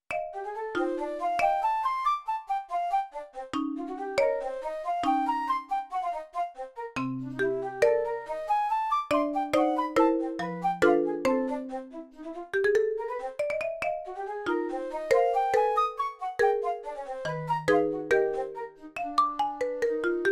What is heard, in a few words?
Prelude